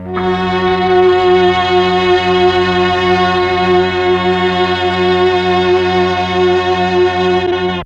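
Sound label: MELLOTRON strings F#2; Big Fish Audio - First Call Vintage Keyboards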